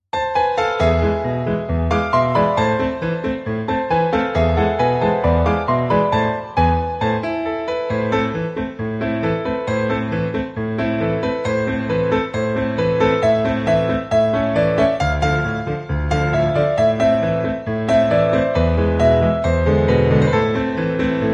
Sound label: Piano Cover